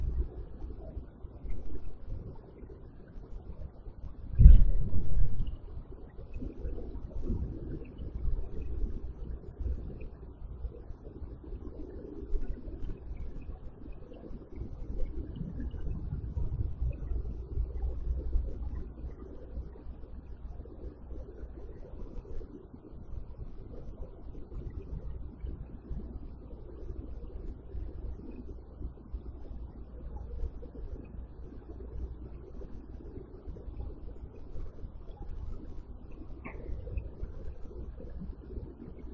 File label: Static